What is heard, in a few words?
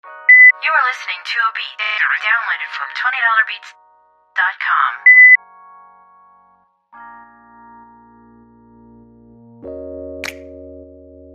Waves, RnB beats